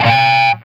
B 3 SOLDANO, Guitars, ROCK; Spectrasonics; Hans Zimmer Guitars VOL-1

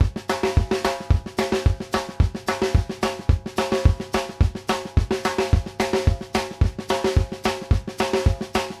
VARI, TRAIN 108; Sampleheads; New York City Drumworks VOL-1; SP TRAIN 108

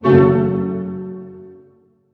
HHIT F# MN, HARP HITS; Sound On Sound - Peter Siedleczek's Orchestra

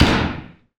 Low Swampper, Industrial Hits; Antidote Advent

